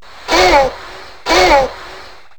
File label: raptor call; ze isla nublar